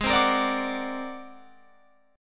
G sharp 9th Flat 5th G sharp Guitar Open F; G#9b5 strummed; JGuitar

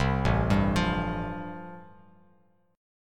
A-Minor 7th Sharp 5th, Bass Standard, chord; Am7#5 arpeggiated; 8,0,5,10; JGuitar

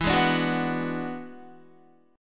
E 9th E down Guitar; E9 strummed

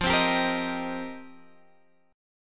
F 7th Suspended 2nd Suspended 4th, Guitar Open G

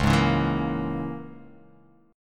C sharp Minor 6th C sharp

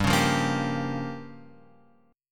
G Minor 7th Flat 5th, Guitar Open F; Gm7b5 strummed; JGuitar

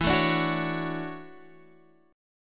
D-Major 9th E; DM9/E; strummed; JGuitar

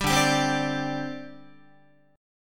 D 7th Suspended 4th, down, Guitar

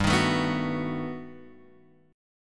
G Augmented Major 9th, chord; G+M9 Chord {3 X 1 2 0 2} strummed

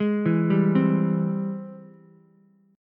Ab Augmented Major 7th Ab Ukulele; arpeggiated; Ab+M7; JGuitar

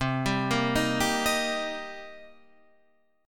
C Minor 7th, Guitar, Half Step Down

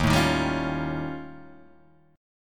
Eb 9th Flat 5th, Guitar, Drop D

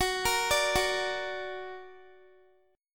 Gb Augmented, down, Guitar; Gb+ arpeggiated; 4E,4A,5D,5G,5C,6F

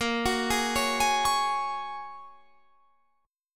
A sharp Minor 7th Sharp 5th

